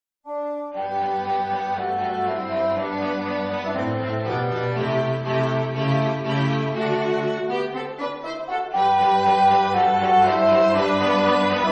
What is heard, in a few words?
Quartet